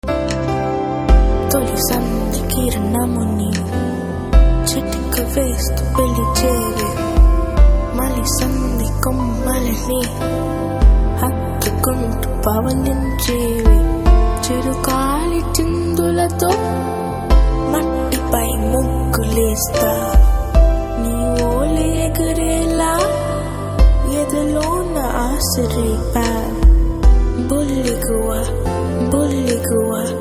BG Ringtone; Robot 2, 2.0 Movie

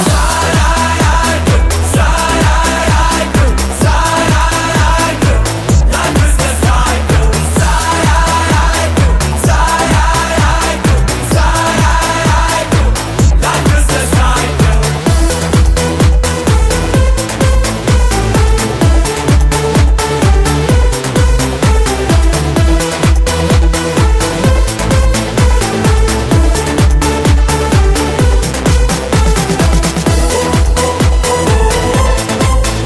Ringtone; Size Zero Movie

